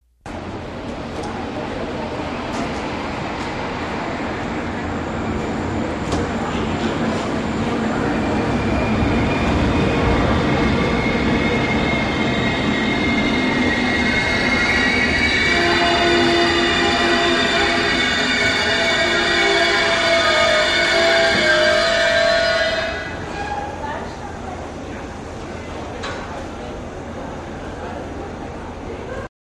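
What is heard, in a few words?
El Train In Stop Screech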